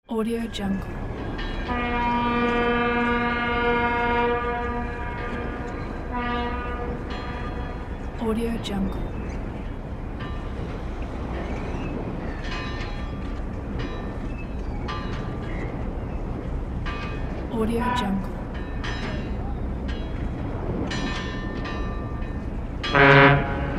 sea port ambience

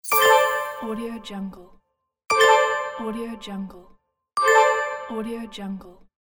rpg pop up

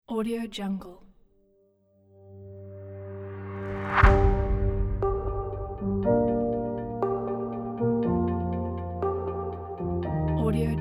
emotional cinematic corporate motivation

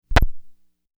turn off spike; sound ideas

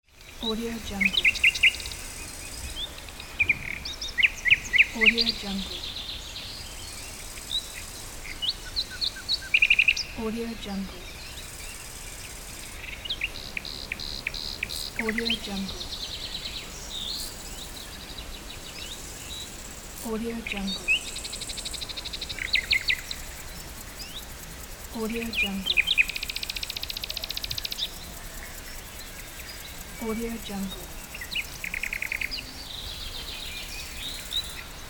nature ambience

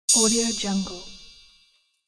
high resonant bell plate

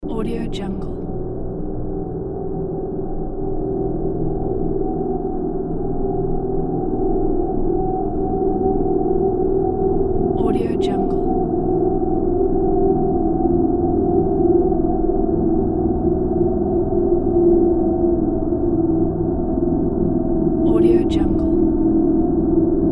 schumann resonance

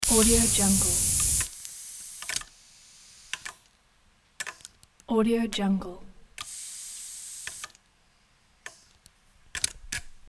anamatronic robot

